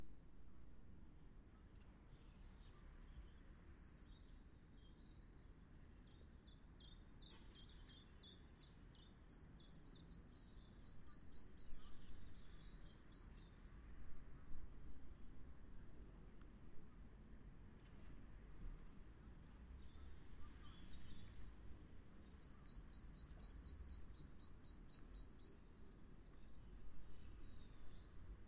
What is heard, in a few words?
lots of birdsong and wing flaps and garden DIY near end